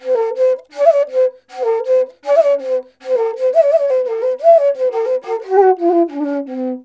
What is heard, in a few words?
INDIA ALTO